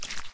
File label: step; block